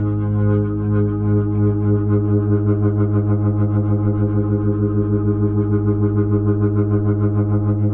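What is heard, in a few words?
1G# R, Slow Fast Hammond, Organs; E-MU Producer Series Vol. 5 – 3-D Audio Collection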